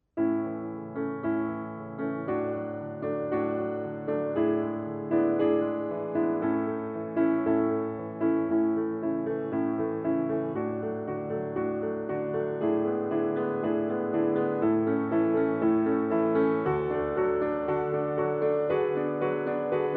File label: piano; saute mouton